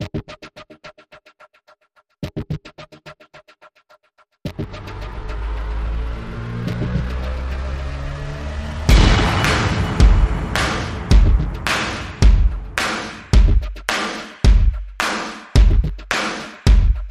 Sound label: Drums and SFX